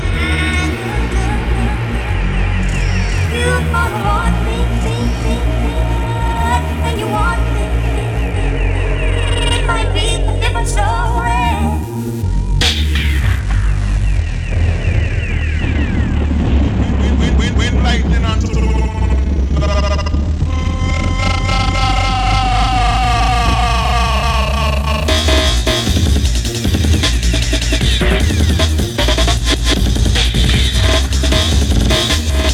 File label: Thunderclap, breaks